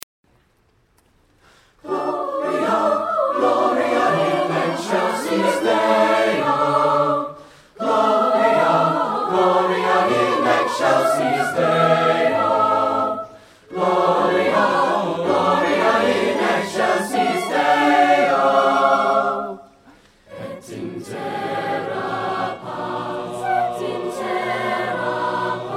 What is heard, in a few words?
Choir - Gloria; 2001-12-19 Deering High Winter Concert